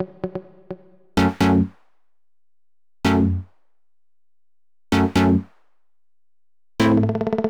Melo 128BPM; Mini Kits

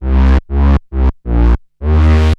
Roland D Line, Roland S100

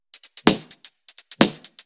Electro Loop 128BPM; Vengeance Samples

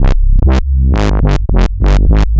Roland A Line, Roland S100; musicradar-synth-samples

